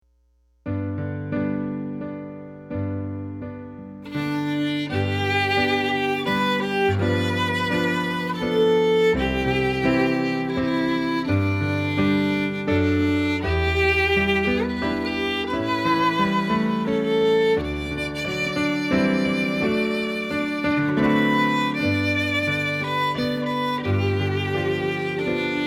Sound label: violin piano